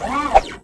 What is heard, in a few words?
ATTACK